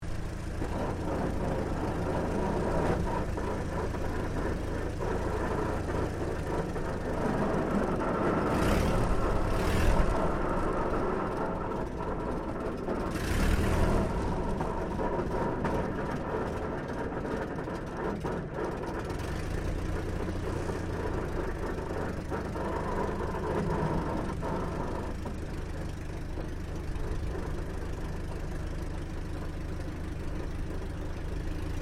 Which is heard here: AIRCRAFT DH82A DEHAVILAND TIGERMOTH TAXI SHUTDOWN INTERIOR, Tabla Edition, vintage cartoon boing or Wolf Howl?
AIRCRAFT DH82A DEHAVILAND TIGERMOTH TAXI SHUTDOWN INTERIOR